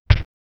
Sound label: generic thud sound